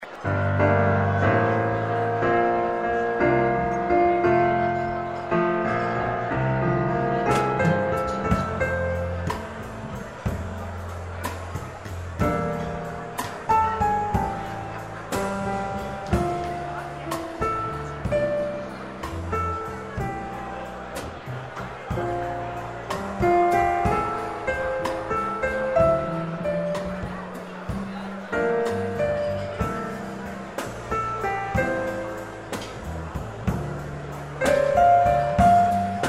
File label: trio